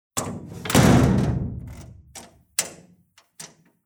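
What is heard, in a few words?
door slam and key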